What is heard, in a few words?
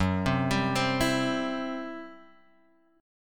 C Major F sharp